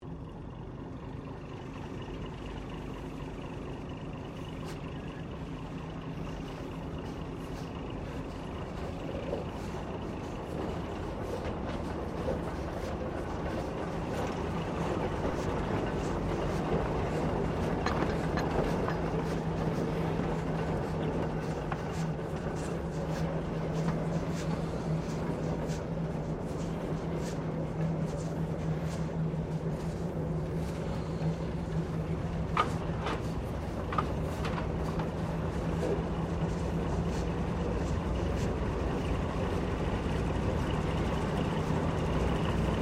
Train Diesel Shunter VA1 Class 1947 Tasmanian Shunting Steam Engine; Bellarine Tourist railway vehicles; Trains